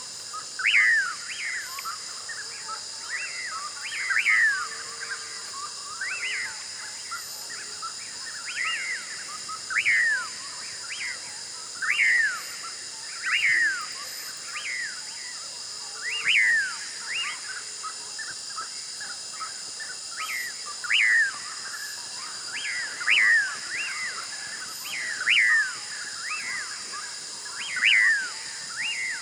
TROPICAL Valley nocturnal bird calls echoing on the mountains